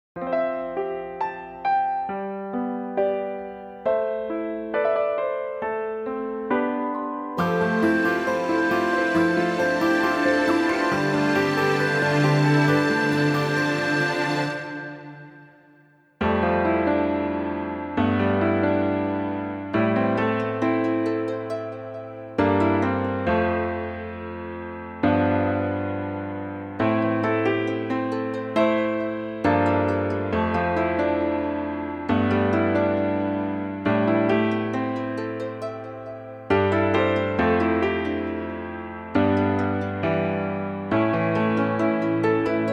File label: (Karaoke Version)